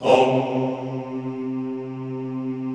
HOMMM C2, Extended Classical Choir; Best Service

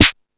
Discoball, drum machine

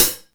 HIHATS, HALF

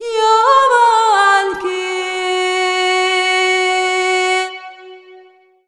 VOX, Balkanish Traditions

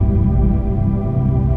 ambience

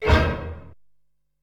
Orchestra Hit, Ensoniq SQ-R Plus

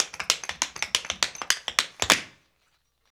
African Tap; African Contemporary; Spectrasonics; Supreme Beats

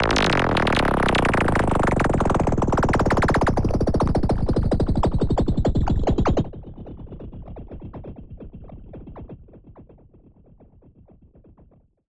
The Grumble

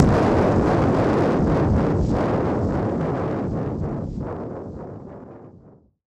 Noise Bomb Harmon; musicradar; bomb factory

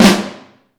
Vintage Snare Drum, 5X14 LEEDY; Q Up Arts; Johnny.C's Vintage Snare Drum

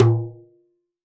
WORLD DRUMS, MIXED DRUMS; WORLD INSTR 2

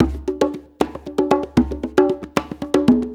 6-8 Congas, Perc Loops; Drum Tools

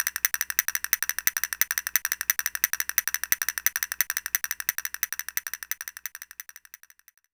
Castanets; Roland M-OC1

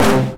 Hit, Stab & Hit; Bitkits

